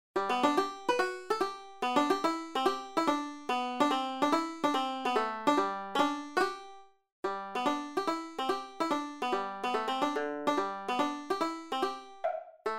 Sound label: Banjo Oddity